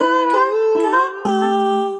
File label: Sliced Melodies, Vocal Phrases; Root A; Ableton; Factory Packs; Voice Box; Harmonic Vocal Racks